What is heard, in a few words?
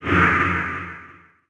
divine ab